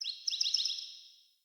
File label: Bird Song; Paragon Bird